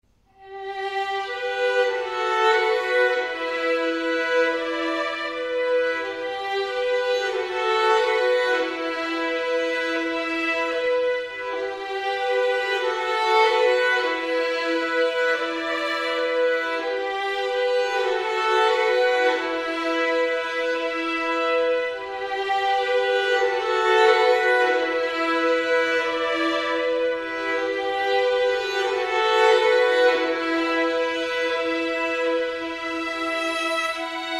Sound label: FIDDLE